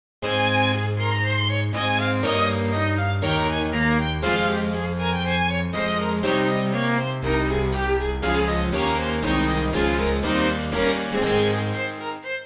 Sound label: concerto grosso; no 1